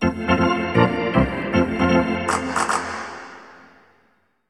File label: Hockey Organ